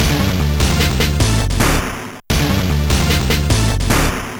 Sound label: beatbox; pinball dreams; Blaizer